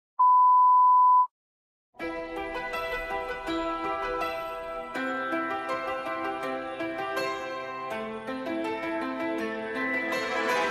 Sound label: floor music